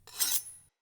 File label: Throwing Knives Equip